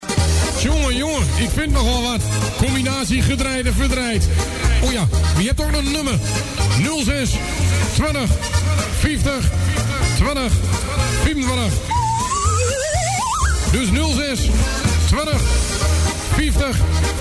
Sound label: Jingle; Combinatie Gedreait & Verdreait